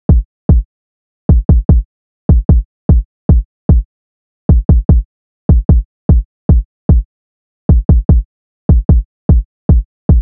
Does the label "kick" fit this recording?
Yes